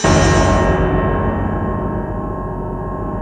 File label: G#3 fuzz; Northstar; The Wizard VOL-1; BELLICOSE